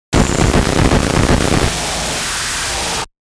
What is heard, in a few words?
Hiss Glitch Fast Industrial; 5.1 STEREO MIX; Blastwave